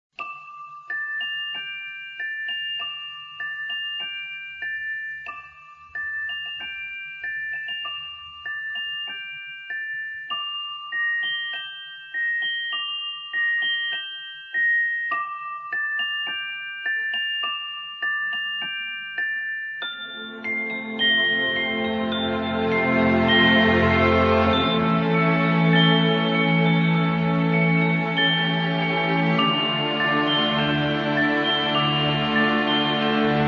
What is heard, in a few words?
Sequence 19 (with music clock)